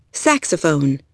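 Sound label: saxophone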